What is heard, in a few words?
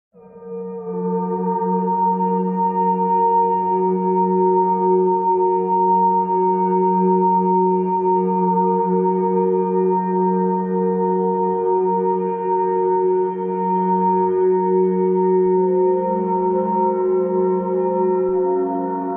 Gloaming Atmosphere - F#Maj 130 bpm; MIDI Clips; Tonal; Atmospheric; Ableton; Factory Packs; Mood Reel